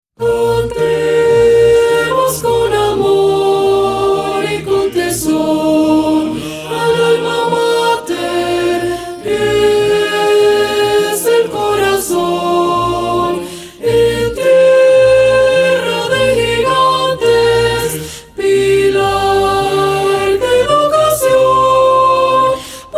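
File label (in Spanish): Himno de UPR Carolina Sopranos, Coro; Coro y Banda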